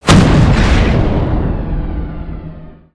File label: Siege Tower Fire; destructibles